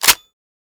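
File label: m590 pump forward; svencoop; ins2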